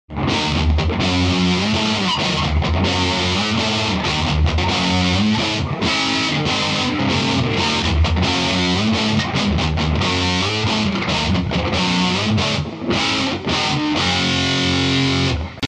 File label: badcat double gain